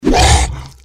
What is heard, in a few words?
deathclaw strike; npc